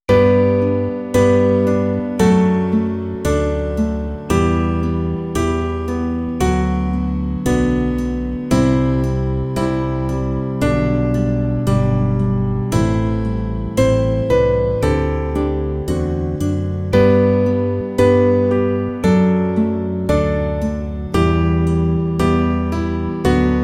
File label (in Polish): wolno szybko